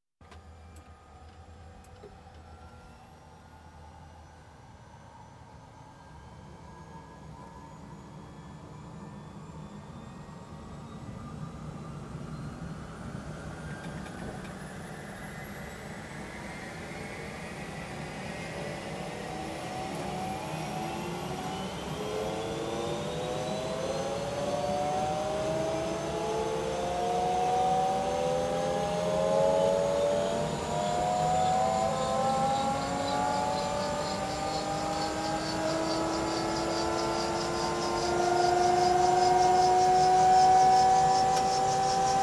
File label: Helicopter engine start from cockpit